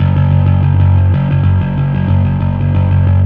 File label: Fuzz F sharp 2; torchlight iii; ps4